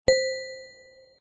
dong

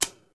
clack

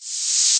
whoosh up

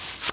peel

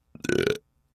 burp

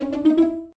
notes sequence

